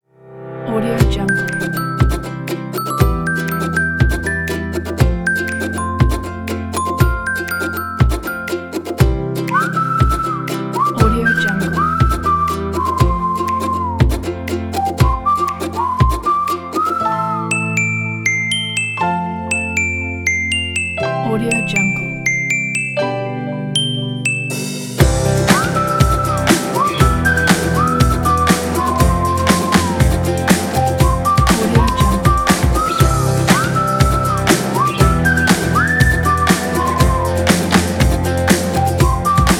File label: upbeat music